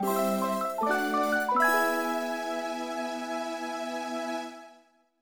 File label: Sting; Art Academy